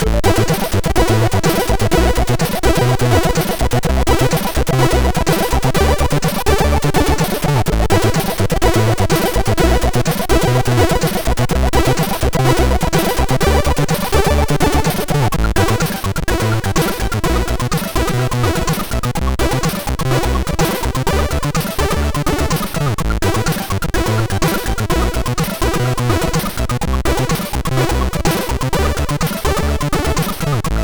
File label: Trap, sid MOS6581R4; Morton Adam